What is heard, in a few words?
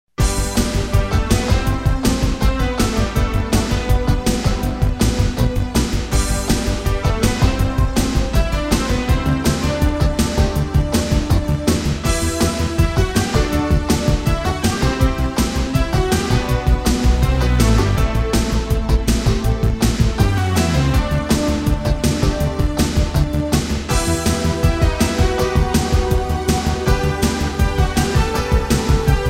Level 6 BGM, PC Engine CD-ROM; r-type complete cd turbografx 16; Irem